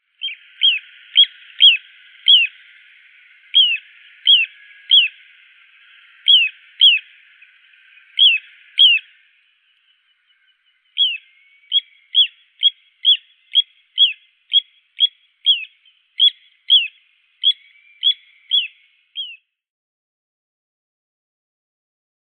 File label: zuek malii charadrius dubius; zvuki prirodi